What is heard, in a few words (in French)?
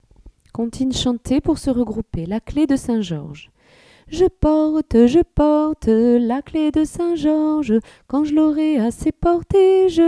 La Cle De Saint Georges Comptines Chantees Pour Se Regrouper